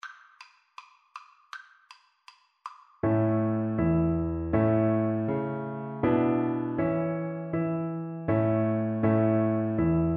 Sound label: deck the halls, Double Bass